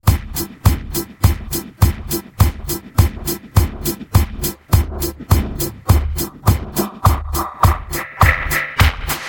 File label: rock dj Drum